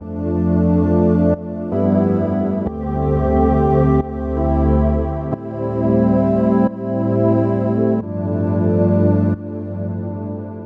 90 A Pad; Crockett

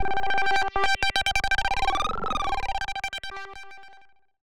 G Bit Weird

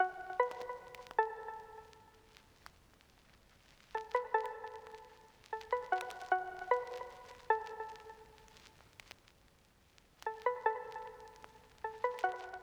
D 152bpm pluck; Bleu Moon 7

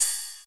Ride Sample

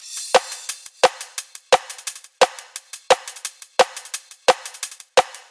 174 Drums Tops

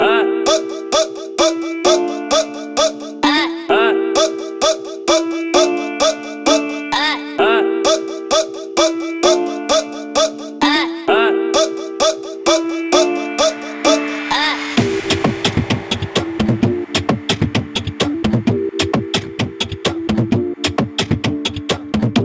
Brazilian Breaks And Bass; Tropkillaz

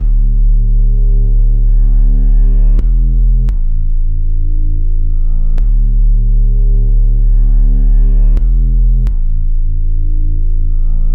172 Am Drone Bass

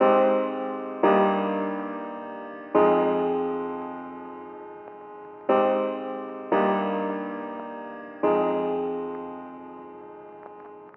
175bpm keys

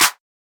Clap

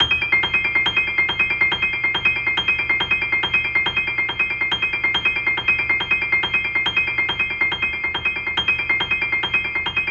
140 piano arp Cm; escape

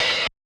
Boom Bap Hi-Hat Open

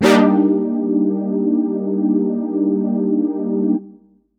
chord chorus air; Bricasti

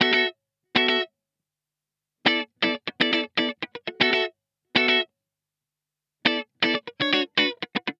120 Bm 70s Disco Guitar

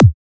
Dynamic Kick

